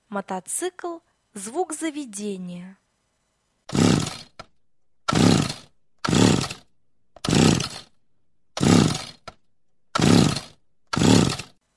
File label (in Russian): Мотоцикл заведение